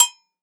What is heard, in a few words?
Cowb Jam, Roland SPD-S